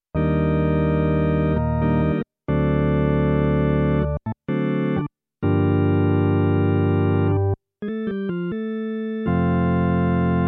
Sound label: Nord Stage 2 Vox